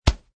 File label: Slap Noise; Clothes Slapped noise; Wrestling and Struggling (Sound Effects:Combat)